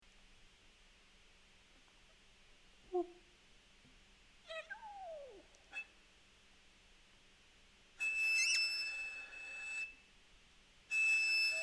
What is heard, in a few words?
frog pond